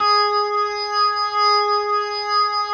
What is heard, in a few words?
G#4S, B3, Vintage Keyboards